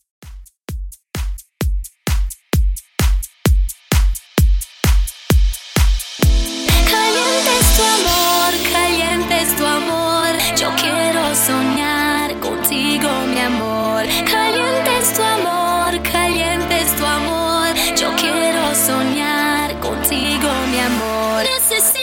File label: Dance, 130Bpm